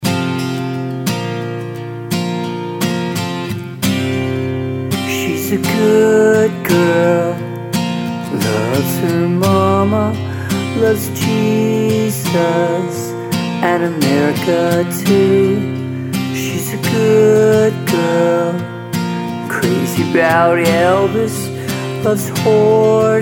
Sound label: guitarist